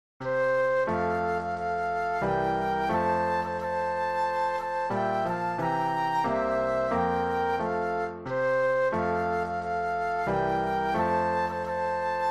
Flute Duet and Piano; christmas

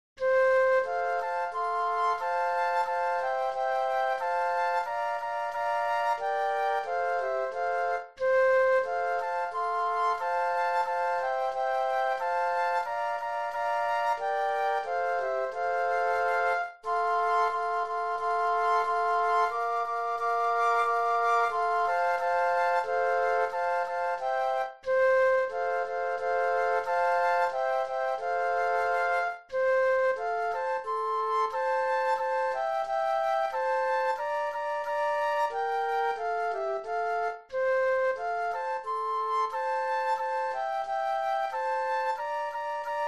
Flute Trio

